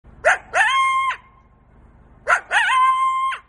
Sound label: Coyote Whimpers